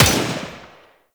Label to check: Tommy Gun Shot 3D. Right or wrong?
Right